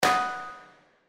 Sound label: Balloon Pop; slots; Valentine Blast